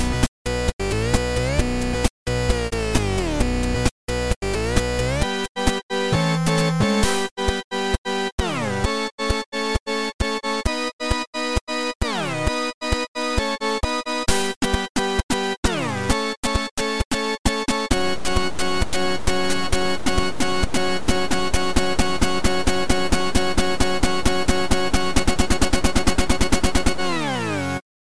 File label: 8bit